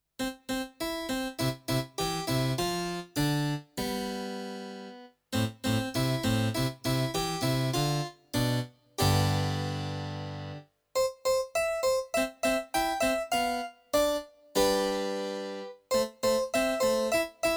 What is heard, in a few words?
Harpsi Vibra